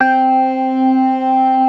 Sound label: ORGAN, C3 PERC; Keith Emerson Signature Series